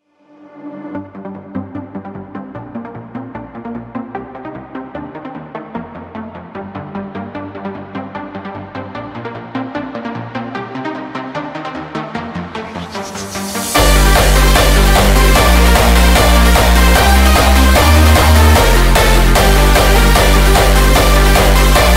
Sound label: lullaby melody